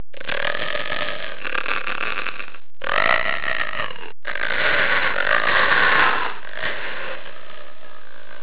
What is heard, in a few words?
monster; halloween